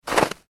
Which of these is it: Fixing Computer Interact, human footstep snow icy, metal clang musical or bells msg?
human footstep snow icy